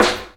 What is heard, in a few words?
Snare, Percussion Hits, Snares